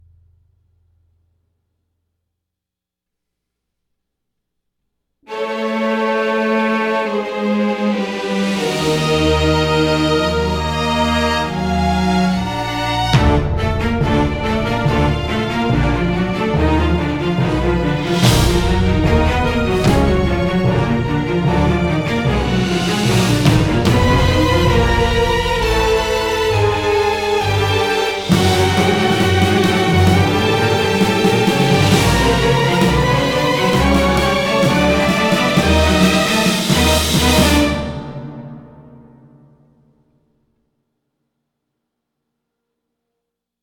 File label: Outro Music; super meat boy 2015 ps vita